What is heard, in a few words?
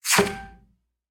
Email Send, notifications; Tinno ATT Calypso 3